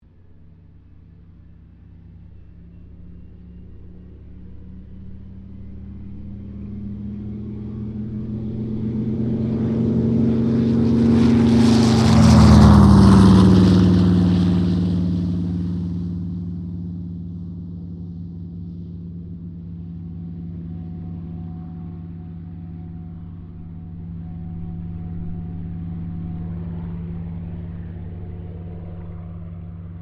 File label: AIRCRAFT CA-13 BOOMERANG TAKEOFF; Airplane Sound Effects; Airplanes (Sound Effects:Vehicles)